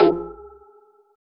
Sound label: Perc Random